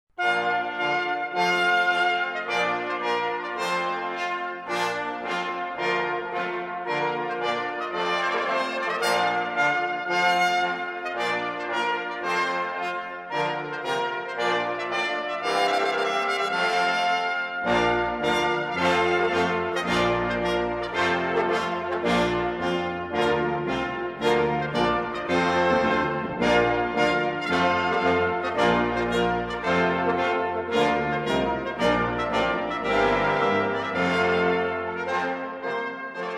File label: trumpet tune